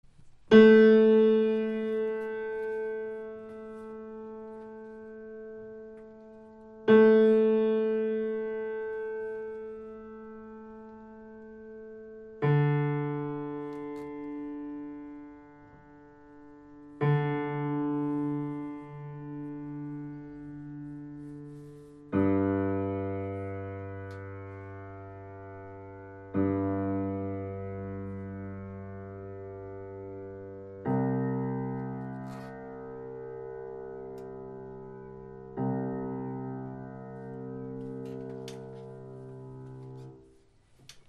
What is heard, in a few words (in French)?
accordage